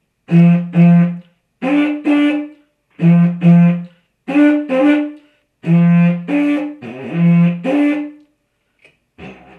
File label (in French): overtone, Les overtones, didgeridoo; Un overtone ressemble un peu au bruit du corps de chasse.